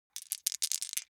foley dice small three rattle in hand short; smartsoundfx

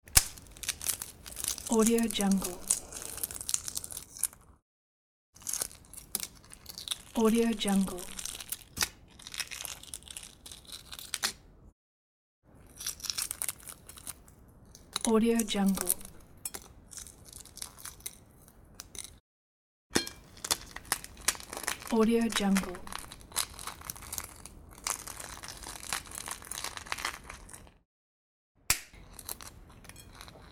egg cracking peeling

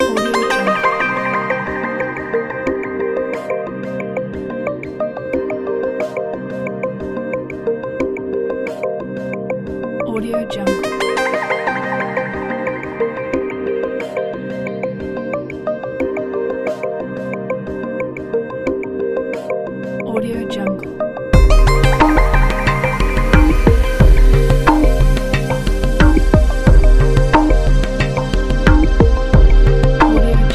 space intro

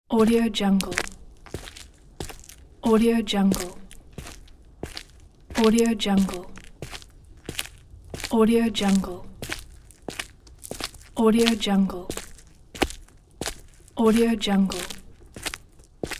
footsteps on concrete

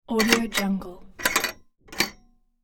shop washer piece movement

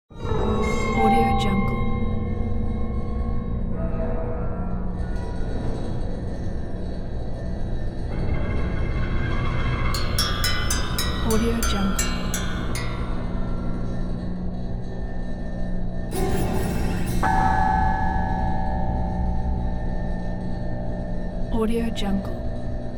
horror suspense dark soundscape

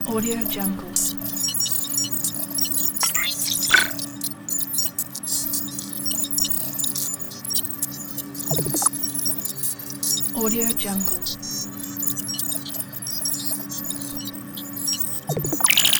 scifi ambience

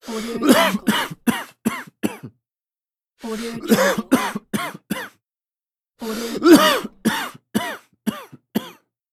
the man cough

